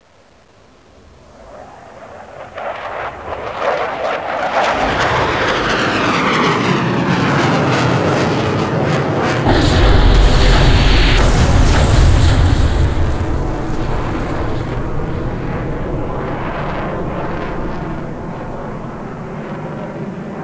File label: damage airstrike